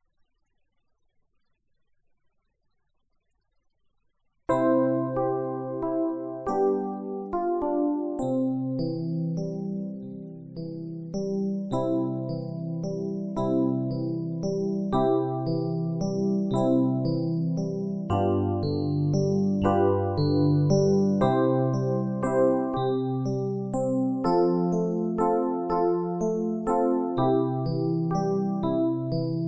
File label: Silent Night backing